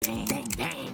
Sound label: bang